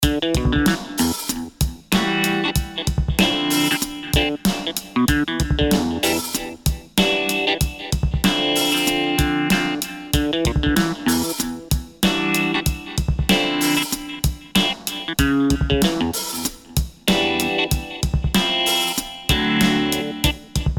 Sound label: Guitar Funkay Funkaaaay; ManyGuitar